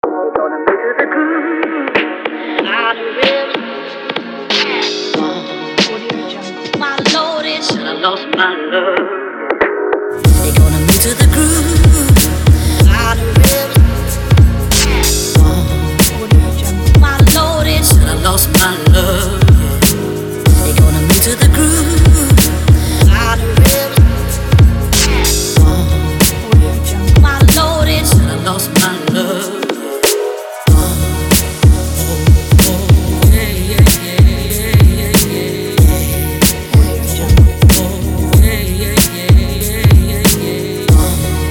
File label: hiphop